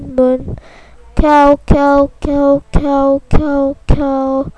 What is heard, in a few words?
cow